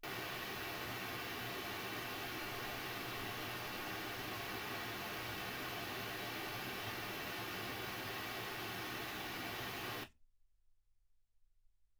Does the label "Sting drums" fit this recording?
No